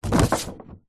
hospital xray sheet pick up from surface

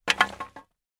impact metal pole rusty hit loose hard surface

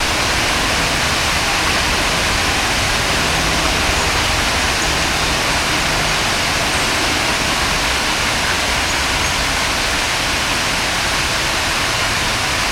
ambience park central park north nyc waterfall; sound effects one

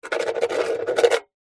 office pen biro writing sign signature metal box

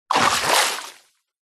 nature water movement surface splash leg; zapsplat

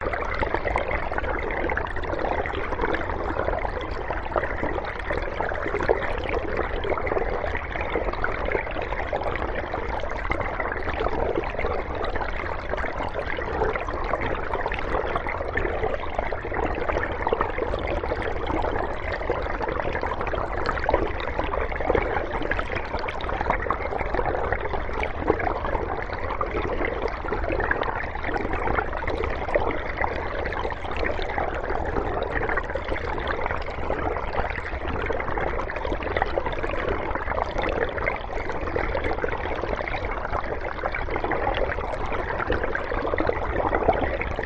River Underwater; soundbox library

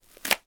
toilet paper rip; foley; sound effects; sound effects one

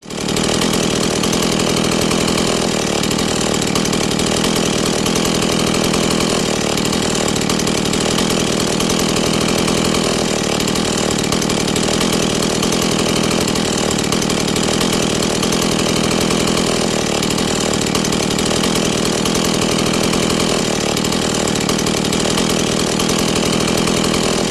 industrial chainsaw idle; zapsplat

